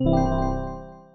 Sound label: startup